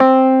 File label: Wurly A1; SoundFont - The Developer's Choice - Volume 1